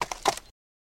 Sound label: Horse Hoof Step On Gra